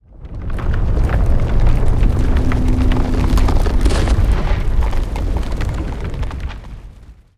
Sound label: natural disaster